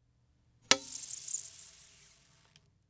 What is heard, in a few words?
Trumpet Click